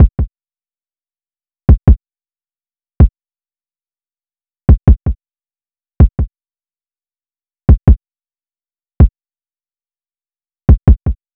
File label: Drum Loop 160 BPM Kick; Loop Stems; Cymatics; Vibes II Drum Loop Collection; Once Drum Loop